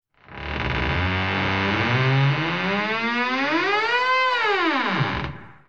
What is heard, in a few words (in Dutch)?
Show Deur Open